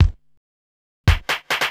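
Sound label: DRUMLOOP ELECTRO 140; Techno2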